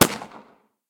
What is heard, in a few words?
m1911 muzzle mono far, weapons; colt